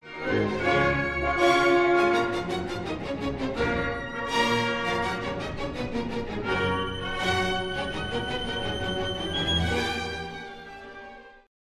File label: Piccolo; le piccolo, Beethoven